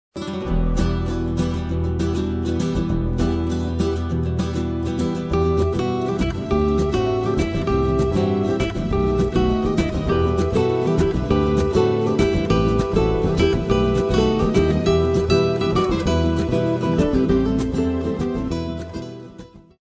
Carol Bells Greensleeves

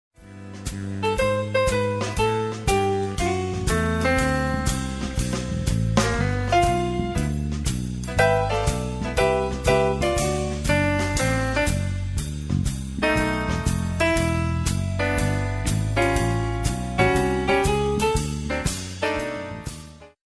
jazz lounge

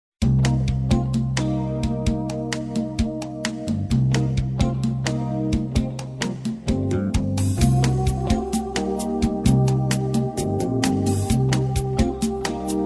jazz

